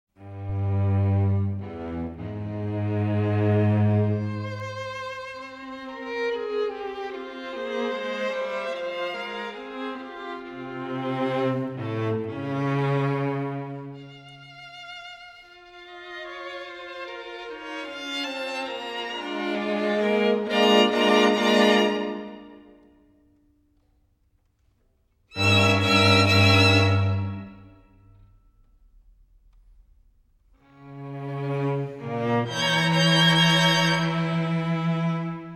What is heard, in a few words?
String Quartet in F Major, Grave ma non troppo; String Quartet No. 16; Op. 135; Ludwig van Beethoven; Beethoven - String Quartets Nos. 1-16